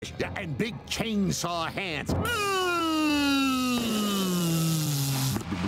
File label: chainsaw hands